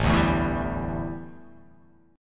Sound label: A 7th Suspended 4th, A, down